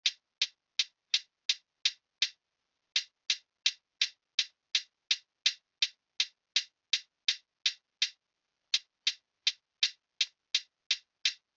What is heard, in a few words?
Crunch Drum Loop 83 BPM Hihat, Lofi; Cymatics - ANALOG EVOLUTION Beta